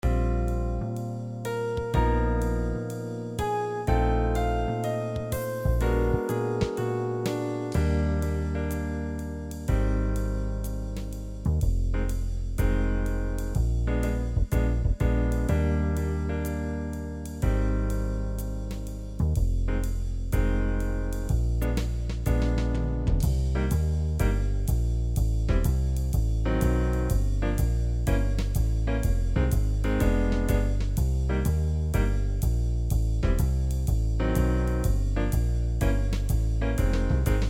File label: jazz